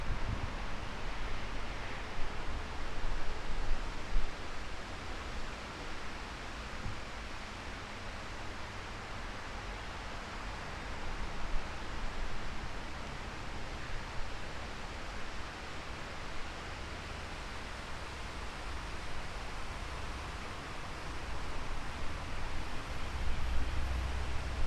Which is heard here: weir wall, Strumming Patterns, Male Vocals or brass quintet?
weir wall